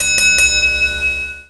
tripleding; njam

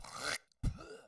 man spit; angband

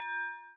bell warn; angband

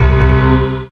error; redeclipse

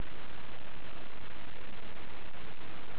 silence

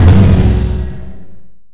explode; redeclipse; weapons; mine

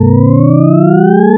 loop; tux

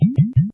blub; funnyboat

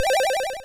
powerup; mrrescue

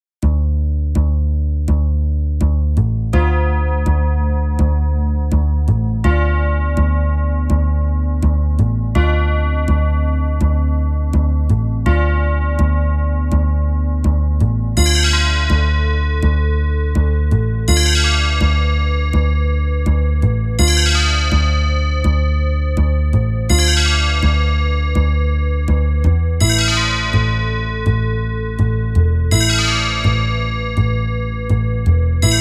Dungeon; scummvm; ultima4

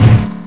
impulse; redeclipse

